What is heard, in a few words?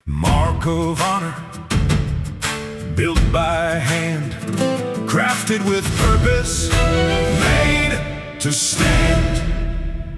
Jingle